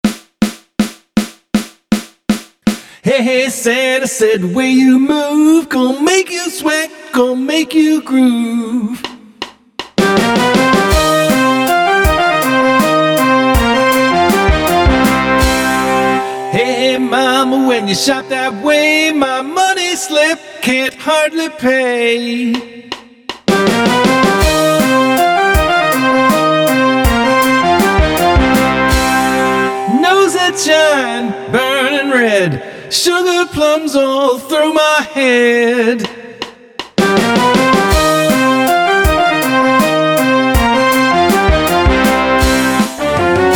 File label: Holiday Parody Marching